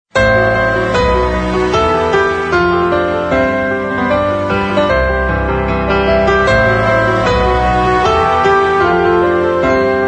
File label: Wedding March Piano Version